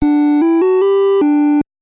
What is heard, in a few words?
Item Obtained; Back to the Future II & III; nes